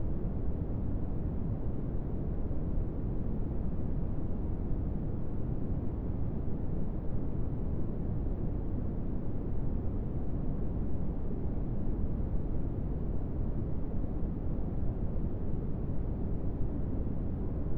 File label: pink noise test file lp at 300hz